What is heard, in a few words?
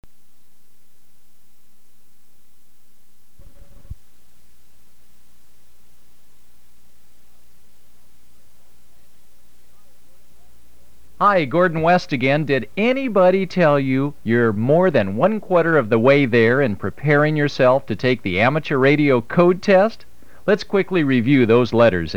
Morse Code 5 WPM